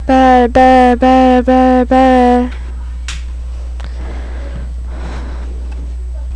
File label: bell